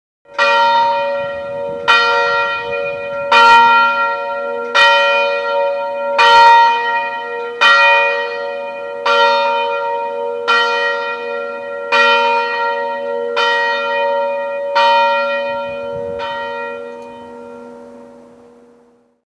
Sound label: Bell